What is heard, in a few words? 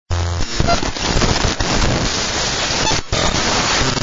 static